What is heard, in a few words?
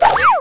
Eyeball Flying; Ruins